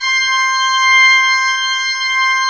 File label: C5, Cathedral Organ; ILIO; Propeller Islands Cathedral Organ